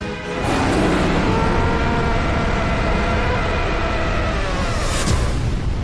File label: hollow scream